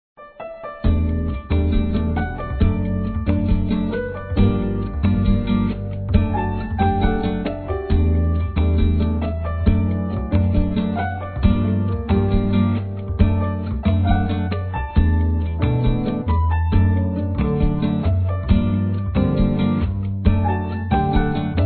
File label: BGM; Samsung; TV